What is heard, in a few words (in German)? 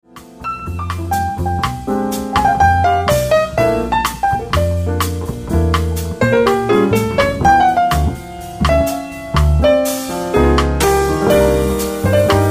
Ensemble Hoerbeispiel